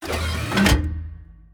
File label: gun reload; World of Tanks